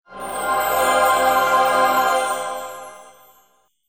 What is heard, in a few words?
achievement; escape from frankensteins tower